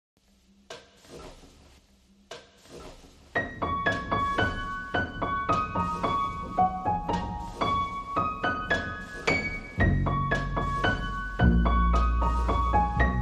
No Guitar

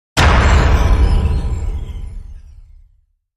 Hit

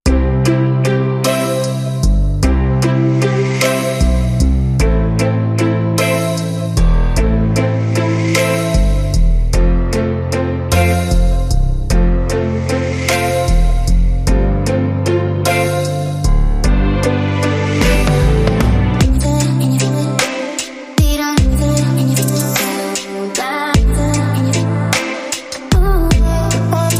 Aegyo Ballad, Rhythm

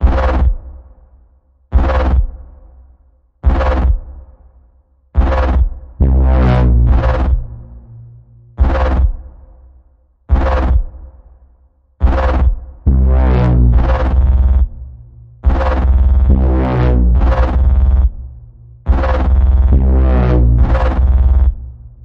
Evil Warping Bass Pulse C, Key of C

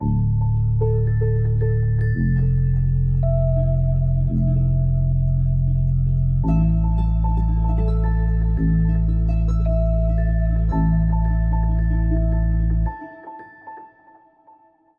Neutral Lights Emerging, No Drums

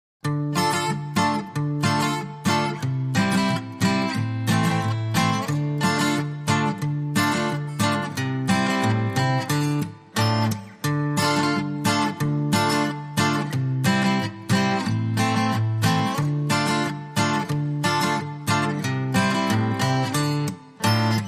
Drunken Sailor, Guitars

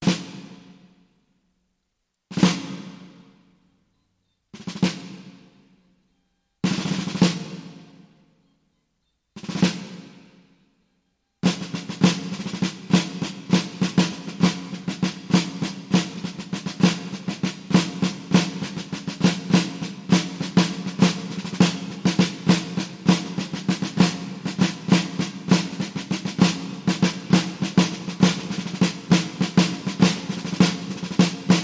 Yankee Doodle Dandy Solo Snare Drum

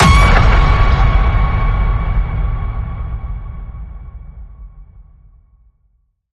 Ping It Loud, C sharp

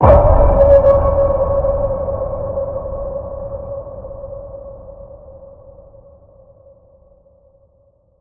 Deep Waters Ping, C sharp

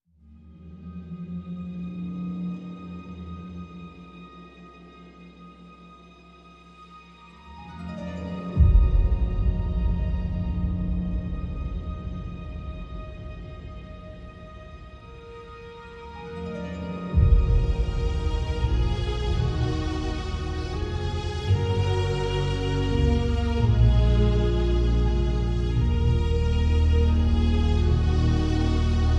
No Bells

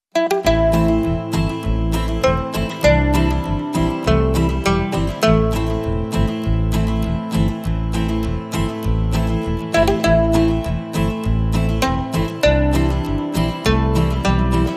Western Hero Acoustic Version

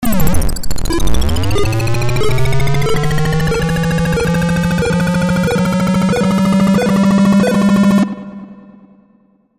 Countdown

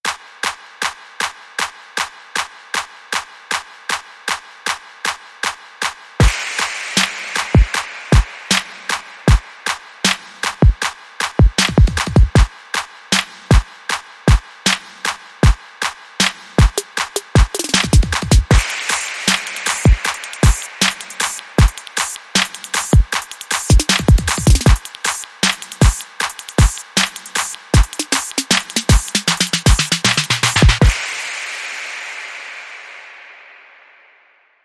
Club Step Beat

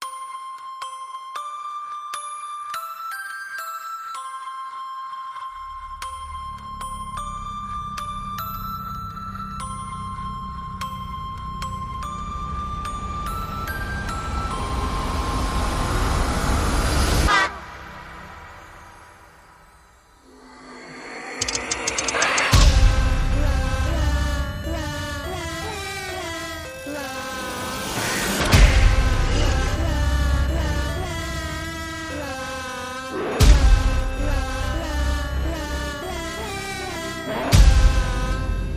Pop Goes The Weasel Horror

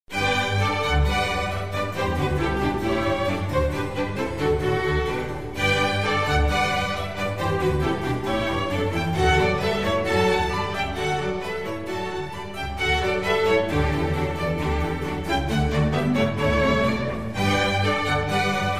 Concerto for Strings in G major; RV151 Alla Rustica; Antonio Vivaldi

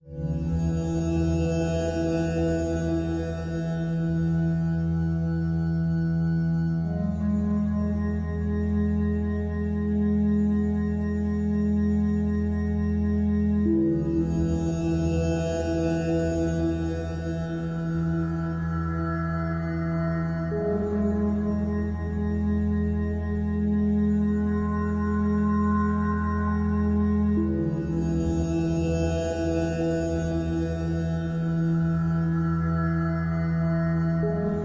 Revival Drone Full

